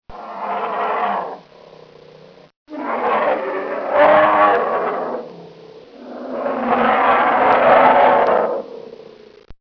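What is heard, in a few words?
medved, bear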